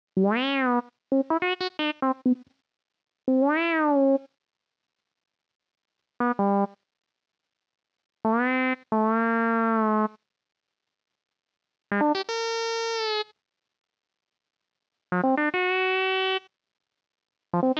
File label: Bleepies